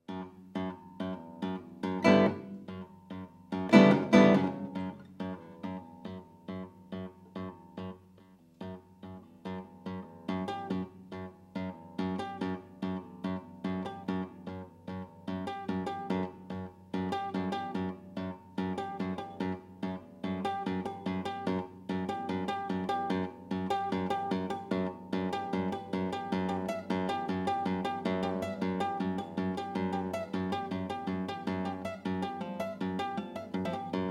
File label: Tapping